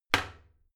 toilet seat close heavy; haus-garten; badezimmer

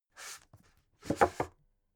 book shelve very hard, wohnen

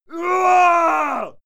male fight scream desperate; schreie; menschen; soundpool

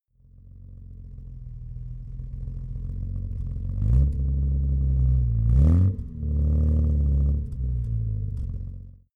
car jaguar e-type rev soft exhaust

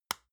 lotion large lid close medium click; berufe; friseur

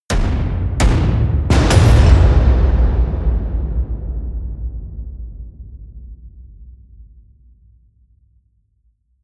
promo pad; whooshes-rises; hits-impacts

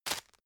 tissue grab hard; objekte; taschentuch

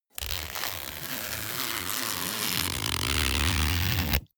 zipper pullover jacket close slow; objekte; reissverschluss

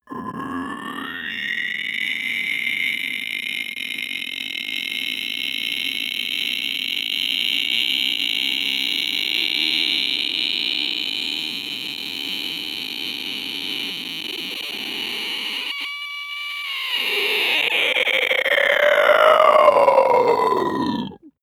male inhale grunt; koerpergeraeusche; menschen; soundpool

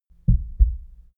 heart beat double calm; comedy-cartoon; koerpergeraeusche

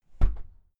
entrance door close gently outside

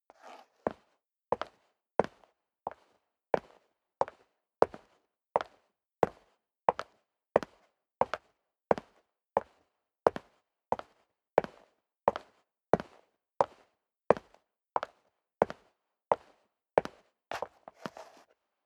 step leather shoes concrete walk sequence; schritte

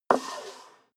step high heels wood creak slide long; schritte

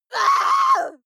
female lunatic scream hysterical short; schreie; menschen

